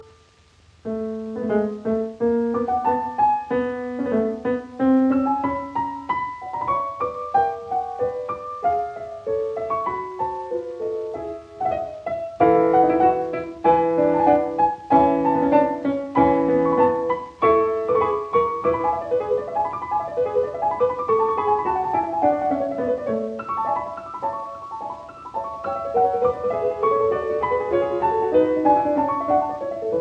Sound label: Piano Sonata No 18 in D major K 576 III Allegretto; Claudio Arrau